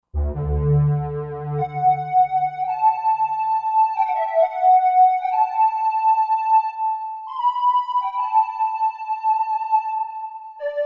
Square Lead, Roland JV-2080

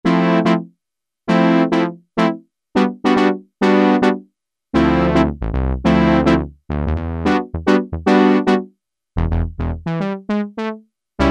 BRASS 1, Kawai SX-240

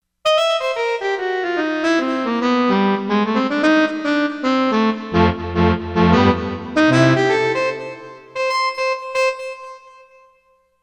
Hiti Sax

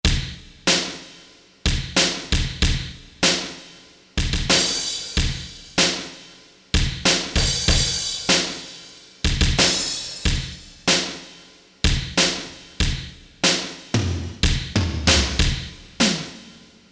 BAD KIT, Drums & Effects, Korg DSS-1

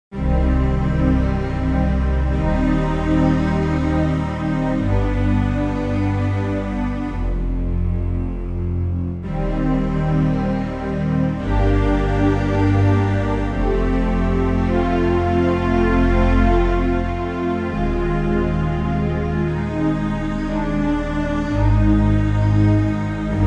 Warm Strings, Roland JV-880; Factory Patches

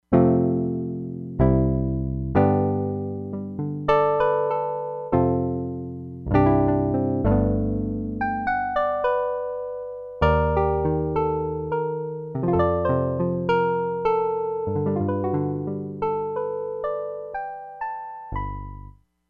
ELECTRIC GRAND, Crumar Bit 99; Factory Programs

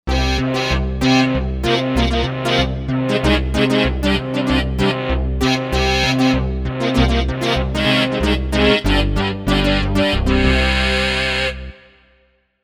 SuperSynth, Korg M1Rex; Factory Patches

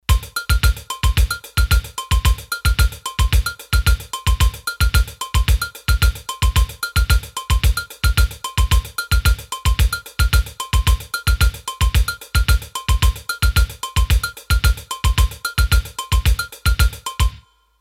Samba, Roland D-10; Rhythm Demos

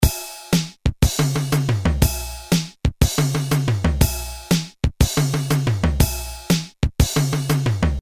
Fill-in Shuffle, Boss DR-220A; Preset Patterns

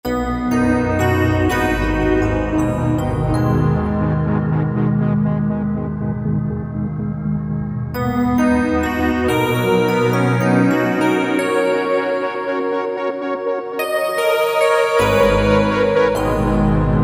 DoomBallad, Generalmusic S2R Performance

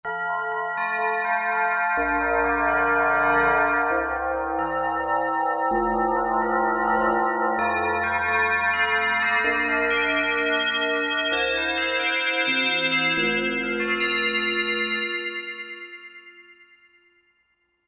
Ring Chimes, Roland JD-990; Factory Patches

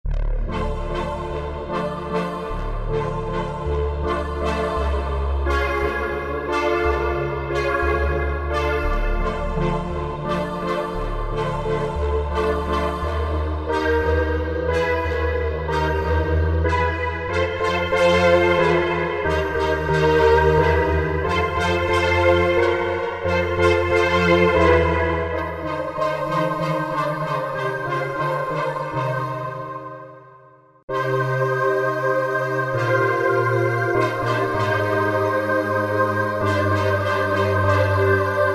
Orchestra, Korg MSC-1S; Korg MSC-1S Variety

